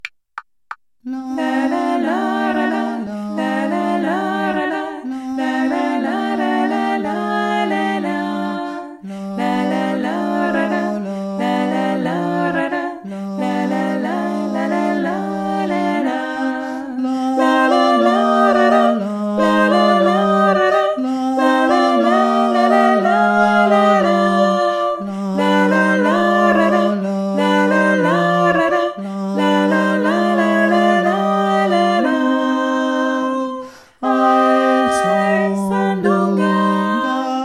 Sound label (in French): VOIX; La sandunga; (Tehuantepec)